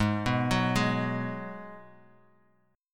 G sharp Minor 7th G sharp; x,x,6,4,6,3,x,x

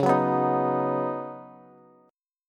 Db 7th Suspended 2nd Suspended 4th, Bass; JGuitar

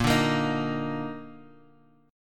Bb Major Add 11th, Bb

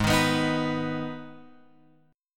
Minor, Guitar, Open F; G#m strummed; x,3,3,6,6,6; JGuitar

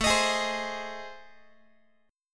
A Minor Major 7th Flat 5th A, Guitar; AmM7b5 strummed; x,17,18,18,16,x; 4A,4E,5A,5D,5G#,6C#

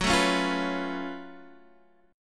F sharp Minor Major 11th, Guitar, Half Step Down

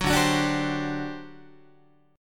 D 11th D, down, Guitar; D11 strummed; JGuitar

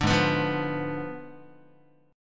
G sharp Diminished 7th, Guitar; strummed; 4A,4E,5A,5D,5G#,6C#; 5,4,2,3,3,x

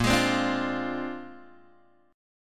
Bb 9th Bb down Guitar Open G

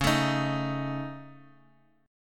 Db Suspended 2nd Sharp 5th, Guitar, Open F; x,8,9,10,x,x; strummed

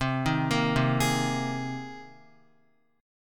C Minor 7th Sharp 5th C Guitar; Cm7#5 arpeggiated; JGuitar

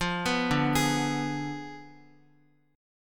D Diminished 7th D, Guitar; Ddim7 arpeggiated; x,x,5,4,2,4

